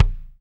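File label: BD, bass drum; Best Service Gigapack 2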